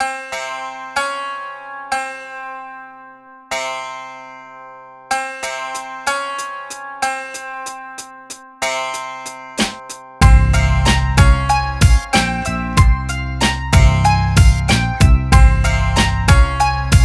094 Cmin, Hip Hop Exotica; Apple Loops; Big Fish Audio

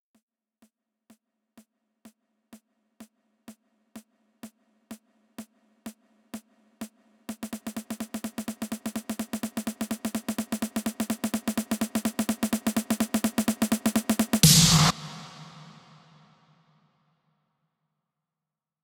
Drum Fills, Future House 126 BPM F; Big EDM; Future House Tools; Construction Kits; Twerk It

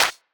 EDM Future Clap; Big EDM; Future House Tools; Claps

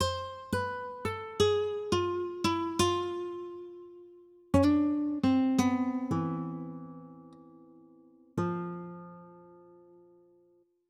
Guitar, Hip Hop Exotica, 086 Emin; Apple Loops; Big Fish Audio

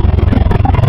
Alligator, Wavetables; Cymatics; Serum; Animals for Serum - Gold Edition

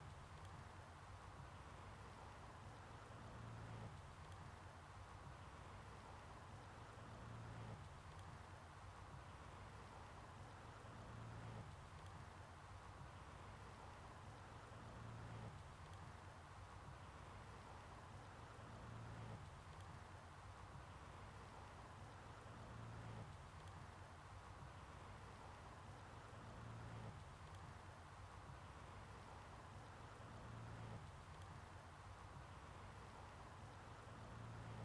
124 BPM A Min Texture; Cymatics; Destiny Gold Expansion; Dior

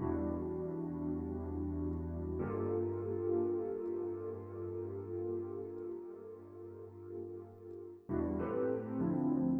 Synth; Hip Hop Philosophy; big fish audio; Apple Loops; 100 Emin

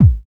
Kick Hit; Hip Hop; 088 C#min; Hip Hop Exotica; Apple Loops; Big Fish Audio

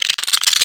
Termite, Wavetables; Cymatics; Serum; Animals for Serum - Gold Edition

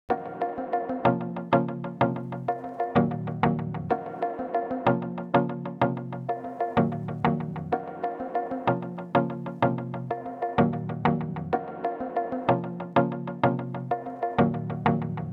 126 BPM A# Min Pluck; Cymatics; Slime; Destiny Gold Expansion

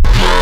Evil Creature; Cymatics; Wavetables Collection Vol.2; Serum

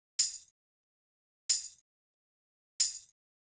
Tambourine, 092 Cmin

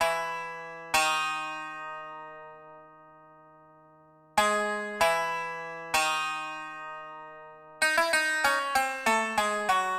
Tzoura, Hip Hop Exotica, 096 Amin

